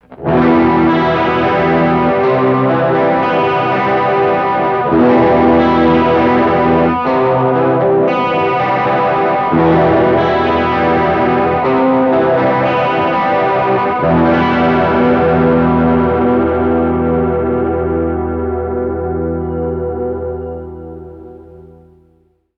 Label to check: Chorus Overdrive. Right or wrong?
Right